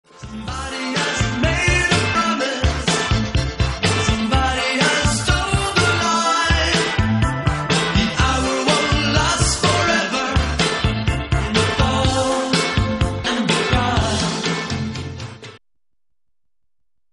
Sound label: call cry